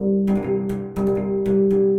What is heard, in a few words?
Resonant Saltanto Cello; Instruments; Distressed Strings; Ableton; Mood Reel